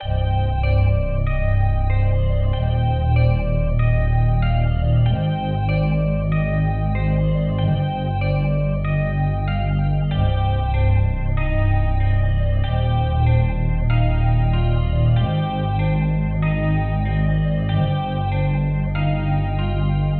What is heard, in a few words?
Loop